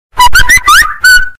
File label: samsung whistle notification sound earrape